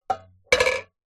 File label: food tin can fall over on surface; zapsplat